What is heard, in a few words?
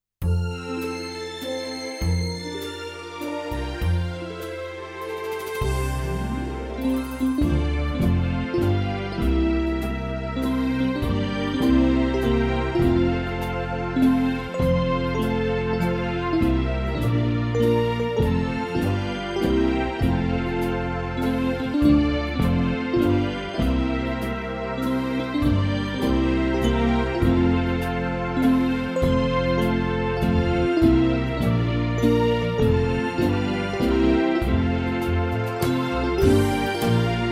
Happy Birthday Playback